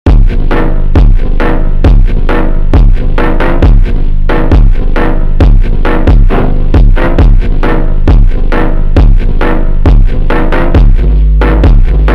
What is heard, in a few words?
Phonk Super Slowed